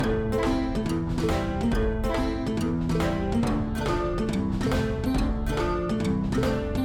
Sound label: score win loop; Deathmatch Village; PS Vita, PS3